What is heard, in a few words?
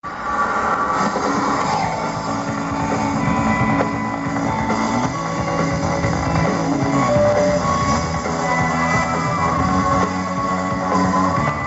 ICC T20 World Cup Ringtone